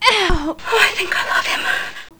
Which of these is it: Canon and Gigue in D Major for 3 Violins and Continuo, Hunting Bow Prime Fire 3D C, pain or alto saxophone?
pain